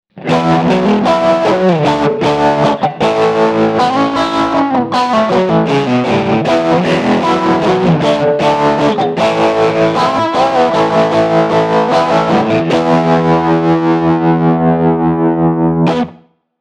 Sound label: Fender Stratocaster – dirty Tweed – tremolo